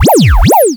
Long Electronic Button Sound

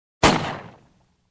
Shotgun shot sound dry

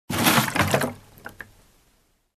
Liquid splashing in metal tank

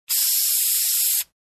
Soda Spraying

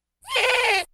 Loud baby goat bleat

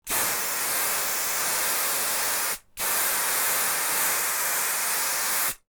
Hair spray double long

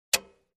Switch On

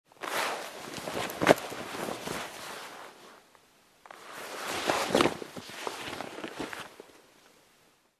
Sorting out Jackets

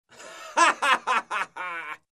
Villain Laugh

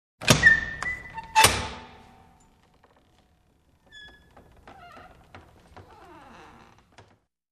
Creaky Door open close

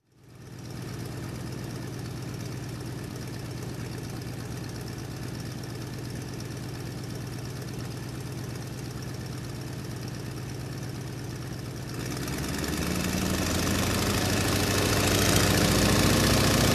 Light Aircraft Engine Idle Noise

